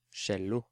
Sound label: Cello